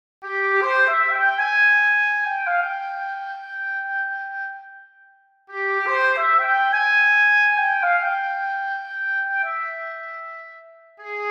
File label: Oboe Legato